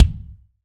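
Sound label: BD DRY, bass drum; Real Mega Drums; Best Service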